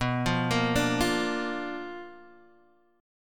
B Minor Major 7th Flat 5th B, Guitar, Open D

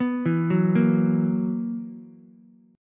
B Major 7th Suspended 4th B down Ukulele; BM7sus4; arpeggiated

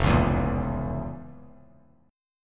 F Major 7th Suspended 4th Sharp 5th F; FM7sus4#5 strummed; JGuitar

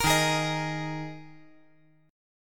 D sharp Suspended 4th D sharp; chord shape

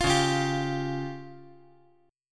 C sharp Major Flat 5th; C#Mb5 strummed; JGuitar

